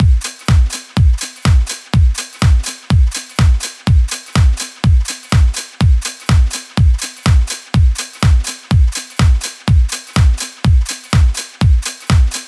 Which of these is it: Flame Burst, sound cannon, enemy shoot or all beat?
all beat